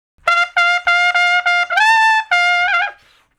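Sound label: Trumpet Slow Blues (Bb V); Crimson Blue and Fabulous Horncraft 4 RnB; Horncraft for R&B; Solos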